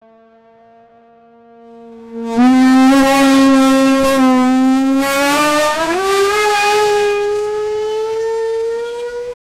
formel 1 sound aus dem, 1970s

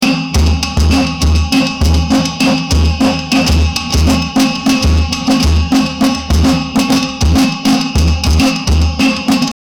ein einklang an trommeln; choral

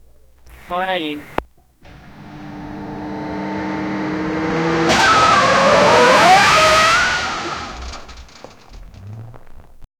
auto gibt mit quietschend, crash

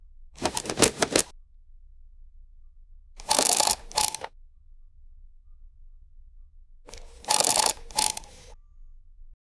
karte die umgedreht wird

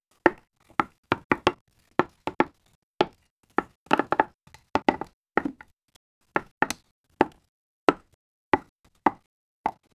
pixeliger sound von holz

